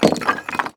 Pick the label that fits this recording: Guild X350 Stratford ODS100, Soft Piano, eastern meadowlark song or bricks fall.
bricks fall